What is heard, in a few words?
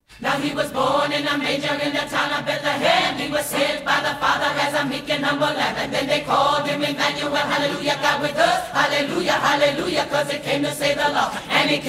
Chant Interlude